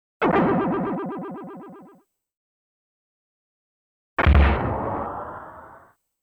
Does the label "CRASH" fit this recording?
Yes